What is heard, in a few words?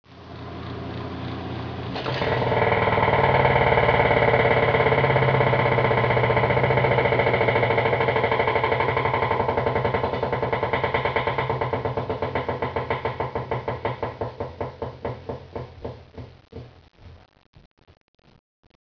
drive system, Washer; Washer Repair; dd Washer